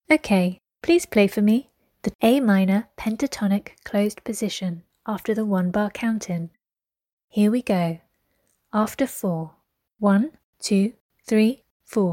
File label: a min pent closed 2 oct